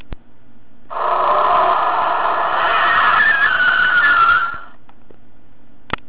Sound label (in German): quitschen, astra; Reifenquitschen; Soundlenkrad; Astra XS; BobbyCar; 20er Soundlenkrad; Pimp my BobbyCar